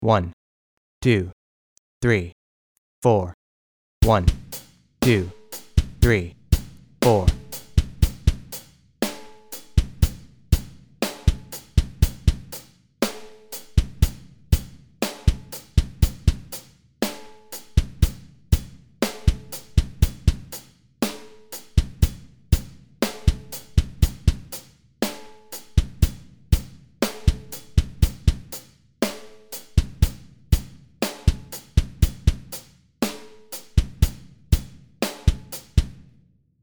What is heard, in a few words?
Bass Drum